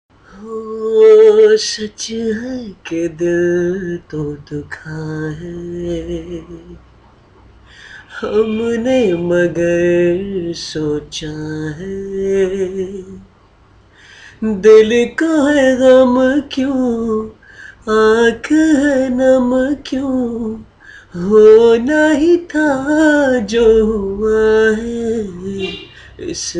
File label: Sad Part Live